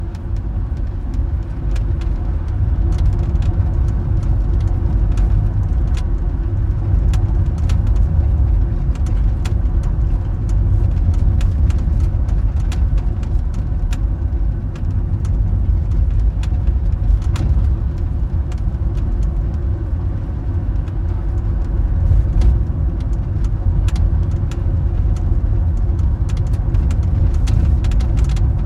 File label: rattle, prius